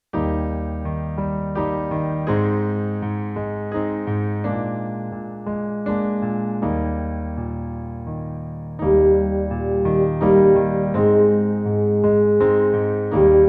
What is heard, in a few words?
Swaying in F, brass